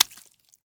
squid flop; mobs; mobs mc

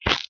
slime nodes step; mcl core

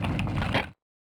shulker close, chests; Minetest; VoxeLibre

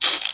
sculk; Minetest; VoxeLibre

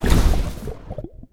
place node lava; mcl sounds

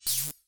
zap on; Minetest; VoxeLibre

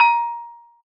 noteblock xylophone metal; VoxeLibre; REDSTONE; mesecons

